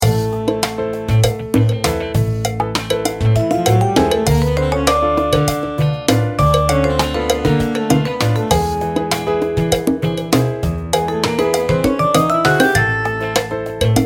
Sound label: twist ringtone